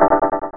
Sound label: Force Field; Realmz 2.2